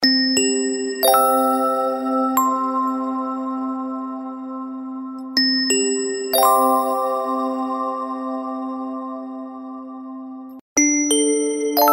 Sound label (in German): glocken